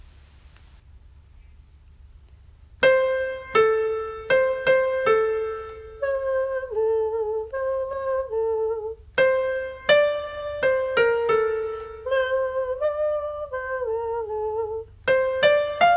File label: melodic echos